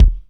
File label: Kick, Kickdrum; Equipped Music; Loungin House